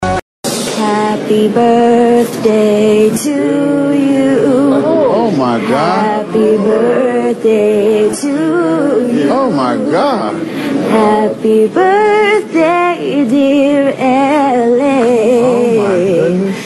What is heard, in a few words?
acapella